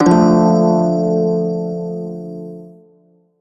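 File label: major chord, shawzin; B; Warframe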